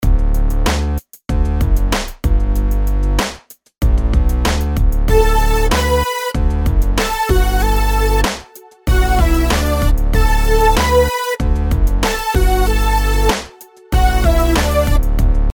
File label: melodies simple Dmaj; melody